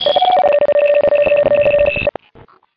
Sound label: Beeping Digital Glitch